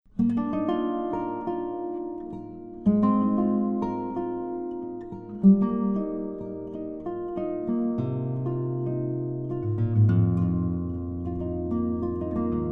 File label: Double Neck